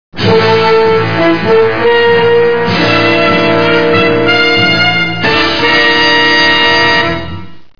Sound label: Trek; valve; grimtrek